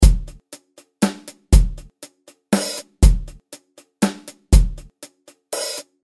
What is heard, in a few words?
hihat